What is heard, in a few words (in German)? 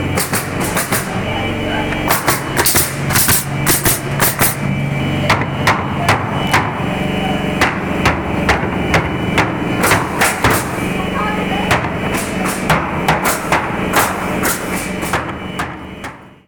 Nagel Pistole und Hammer